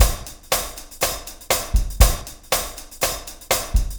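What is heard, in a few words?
room rim beat hi-hat, 120 4 4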